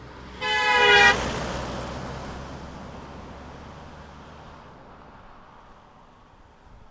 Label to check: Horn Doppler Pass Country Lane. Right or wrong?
Right